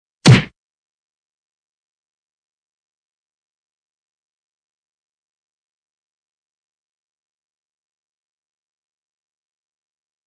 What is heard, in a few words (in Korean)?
Fights, 싸움-전투; 헐리웃특수효과음